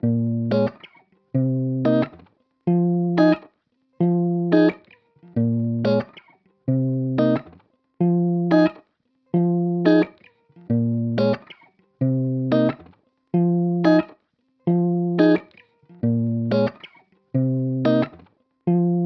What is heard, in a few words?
180 BPM F Min Main Chords, Guitar; Loop Stems; Cymatics; Miami Guitar Pack - Pt 2